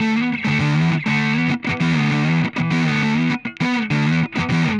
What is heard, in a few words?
Push Guitar Riff, Rock; Guitars